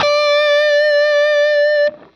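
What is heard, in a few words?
fuzz guitar vibrato; MAYFUZZ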